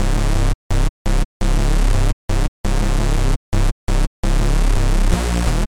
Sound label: Bass; Cerbera